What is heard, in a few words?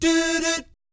"DOO DOOT", vocal jazz fx